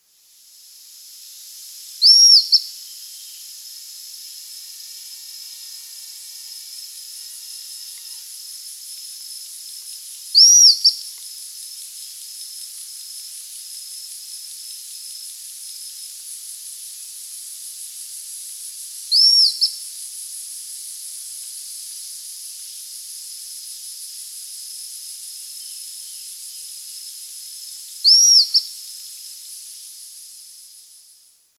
milan diodon, chant

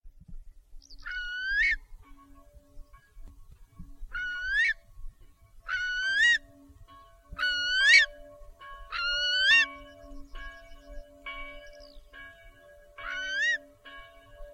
courlis cendre

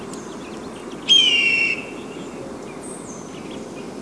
buse a queue rousse

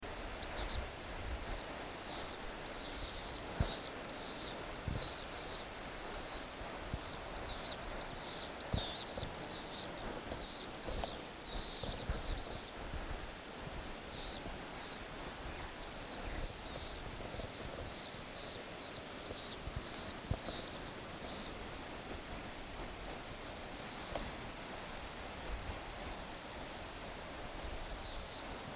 martinet pale, chant

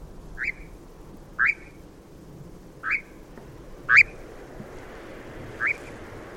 engoulevent d europe; chant

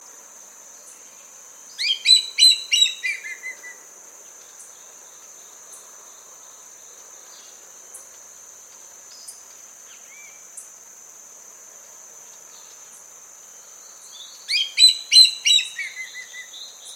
buse noire, chant

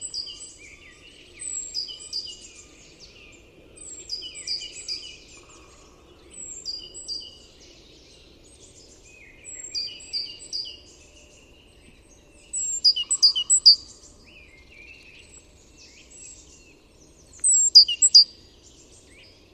mesange charbonniere; chant

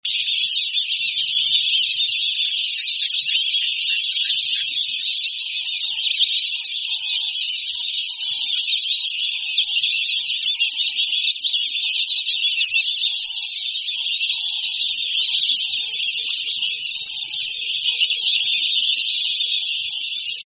dendrocygne veuf, chant

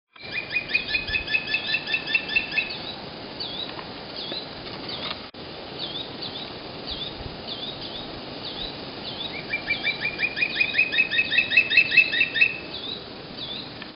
baza malgache, chant

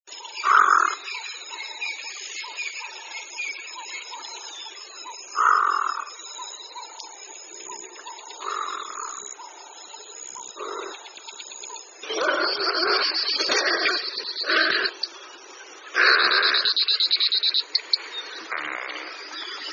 crabier a ventre roux, chant

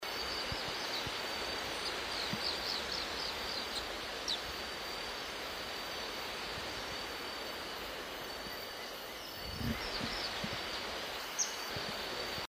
martinet a croupion gris

